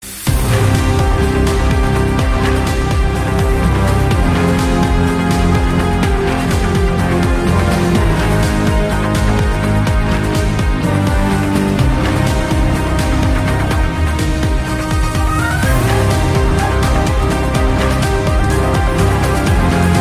8Bit; World of Tanks

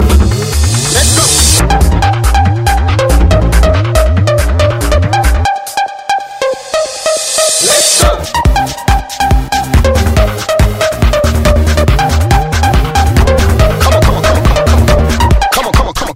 trance, ringtones

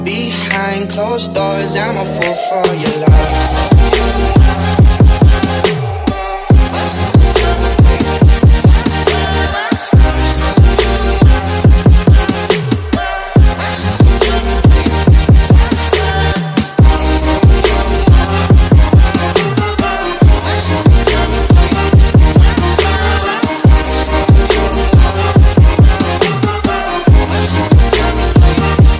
Extreme Future Bass

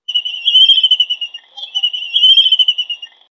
Albatross Chirp

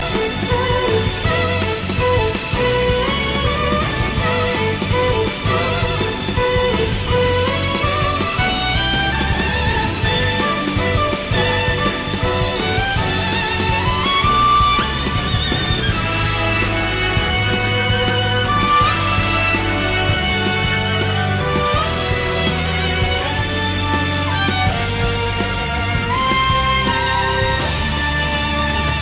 battle theme

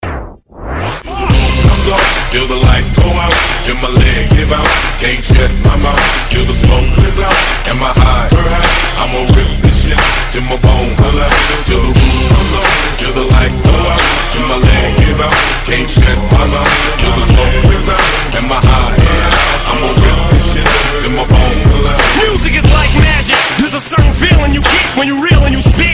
remix, hip hop ringtone

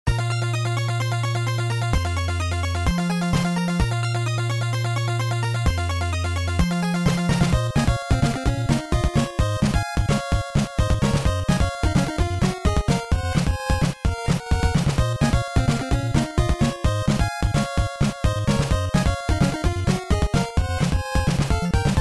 theme

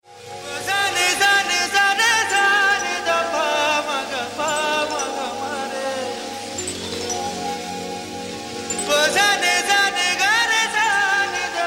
the flute; ringtones

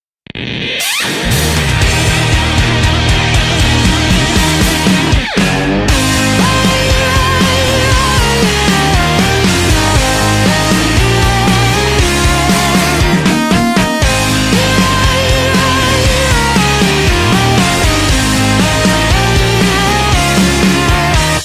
Guitar

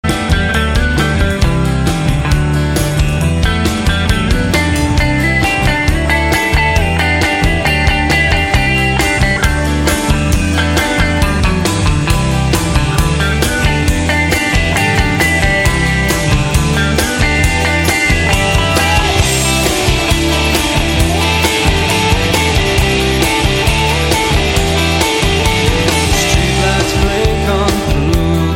interlude instrumental

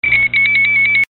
Ballot Box; in Brazil

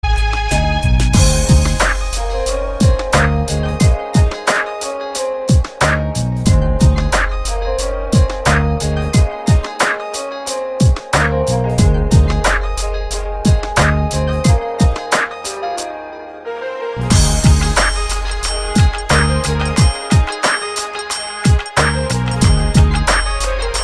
hip hop

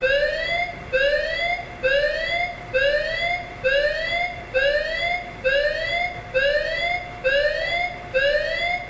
MCOM Alarm Siren

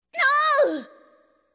Scream; Melee Ness

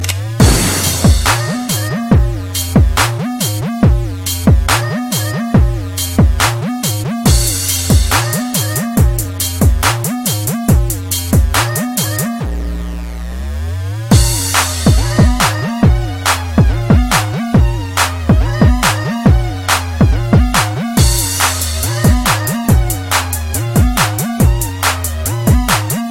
black beat, hip hop

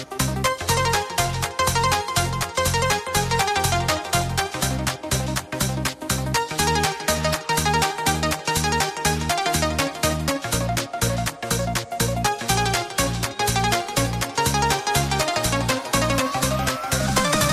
Avar Lezginka Dance

